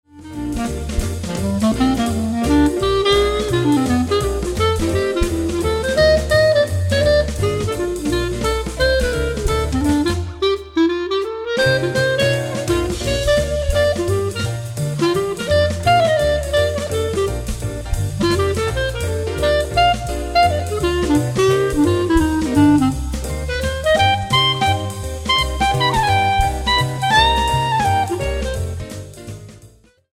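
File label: Clarinet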